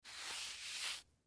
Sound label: Paper Towel Wipe, Paper Towel Wipe Liquid Mess Single Stroke Slow; Cleaning; Household; Blastwave